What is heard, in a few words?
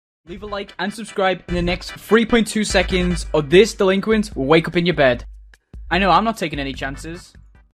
music